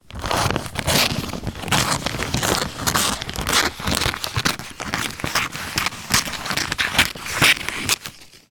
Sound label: office scissors cut wrapping paper